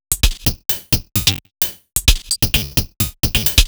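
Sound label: Odd Glitch, 130 BPM, Percussion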